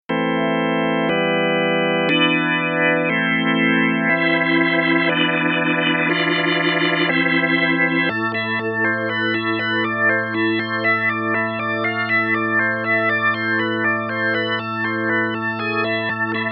Tonewheel Organ B3; Kontakt 5

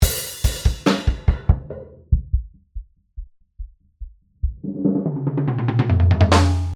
Synth Warmer FILTER

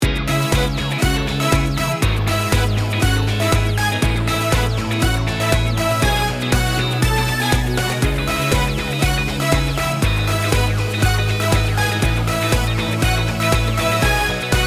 Guitar compmix

